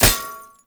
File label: Drone Hit Notification; Enemies; Abilities; Warframe; Tau; Dax Medic